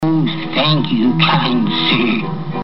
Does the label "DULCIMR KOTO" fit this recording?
No